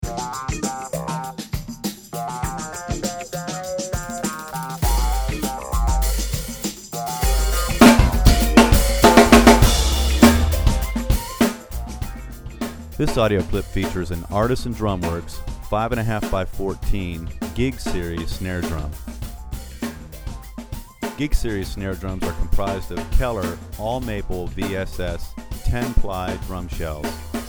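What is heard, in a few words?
waterfall bubinga jingle with voiceover, drum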